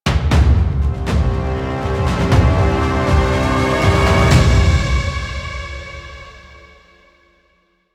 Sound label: Stinger; Warframe; DE; Kuva Lich